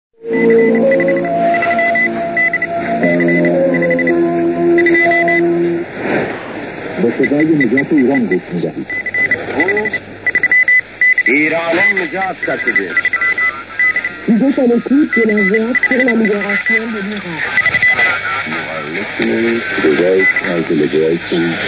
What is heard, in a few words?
interval signal; vot liberation of iran c1985